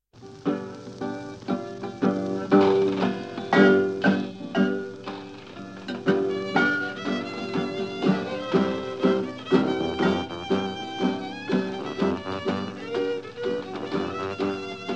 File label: Jug Band Music